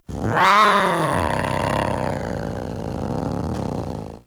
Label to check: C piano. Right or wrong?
Wrong